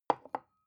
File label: glass put down tipping